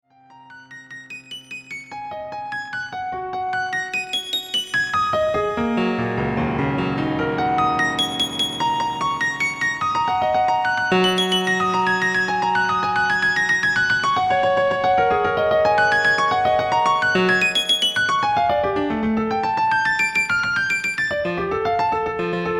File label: piano arp